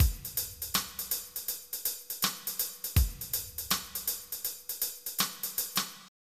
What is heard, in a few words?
reggae; fruity; flstudio; MIDI Music File; General MIDI